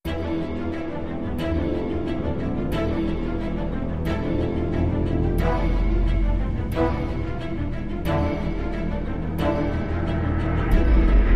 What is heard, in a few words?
No Choir